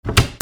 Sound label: DOORS